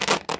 rubberpull, balloon; World Of Goo

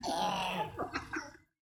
monkey chuckle; boss

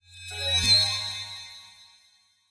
level up; CrossCode; battle

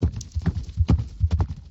buffalo run; CrossCode; battle

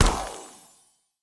ball hit cold medium; CrossCode; battle

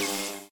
shock short

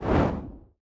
fire generic attack; CrossCode; battle

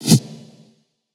throw long

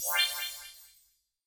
drop unique purple

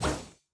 throw ball cold; CrossCode; battle

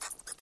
batsqueak; World Of Goo

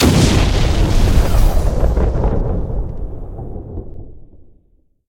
detonate; World Of Goo; BombSticky

